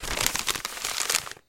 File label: Paper Grab Folding; paper mvmt